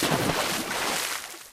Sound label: water splash; tf; Source Engine; video games; ambient